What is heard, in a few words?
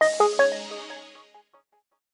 Power Off